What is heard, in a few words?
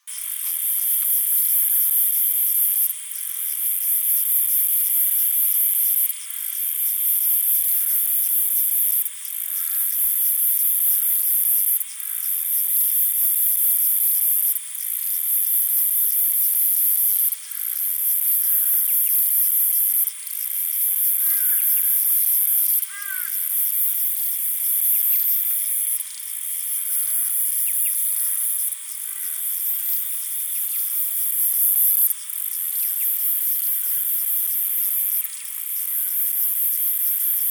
Myopsalta longicauda Myall Park